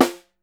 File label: SN, Percussion